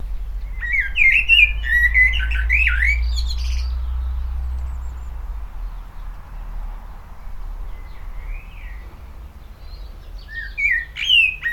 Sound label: blackbird on board stereo mic with windshield; normalised